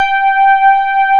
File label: soft rhodes G4; East West; Global Sounds; D50